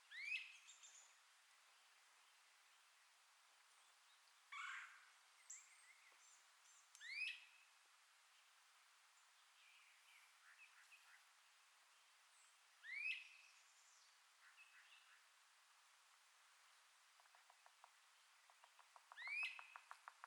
great crested flycatcher and red bellied woodpecker